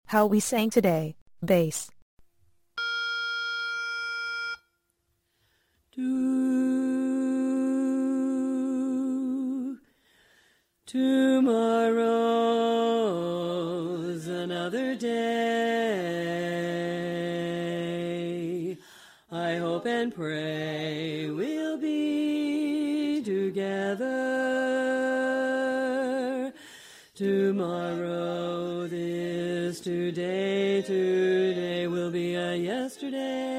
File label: How We Sang Bass